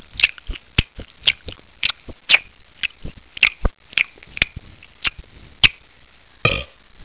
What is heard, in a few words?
chew burp; body